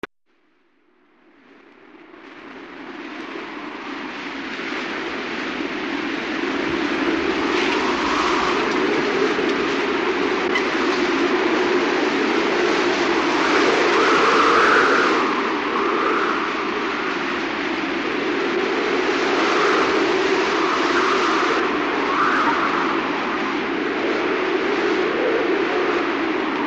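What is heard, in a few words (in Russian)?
ветер в лесу слышно скрип на 35-ой сек; Звук ветра в лесу; звук ветра в листве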